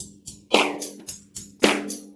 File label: Breakbeats loop, 70_s Breakbeats